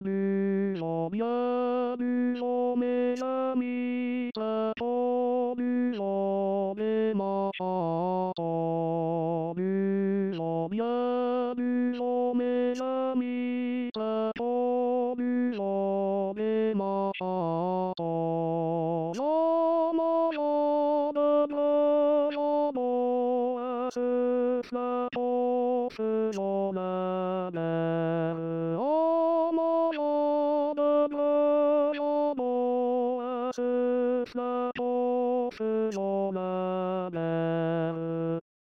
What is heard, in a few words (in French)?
tenor